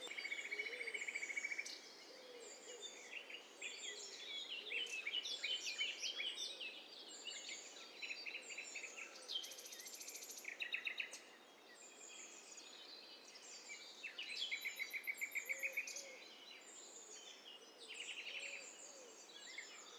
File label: STEREO BIRD COCKTAIL PARTY